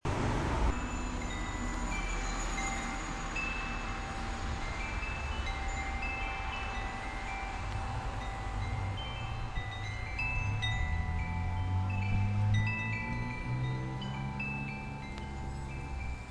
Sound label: singing windchimes on studio patio; sunset junction